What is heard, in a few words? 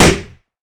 Desk Hit